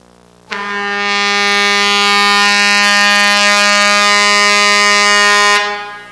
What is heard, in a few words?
TRUCK; Transportation